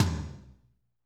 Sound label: TOM, Drumscapes Roland, Rock; Northstar; Med. Rock